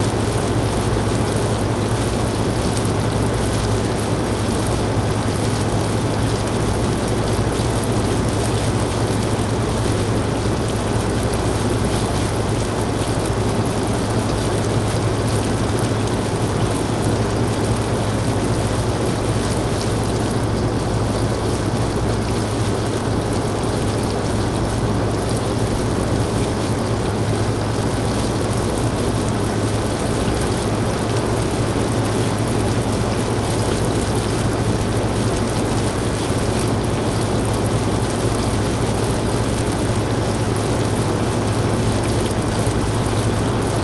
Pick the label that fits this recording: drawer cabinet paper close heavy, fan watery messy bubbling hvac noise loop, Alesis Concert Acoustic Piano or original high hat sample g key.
fan watery messy bubbling hvac noise loop